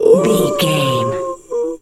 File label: seal walrus death